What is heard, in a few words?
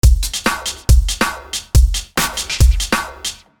drum beat